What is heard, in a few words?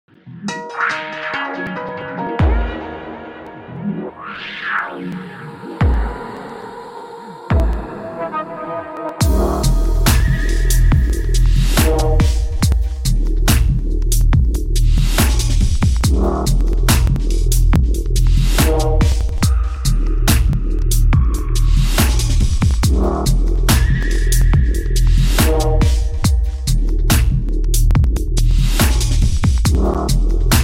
dubstep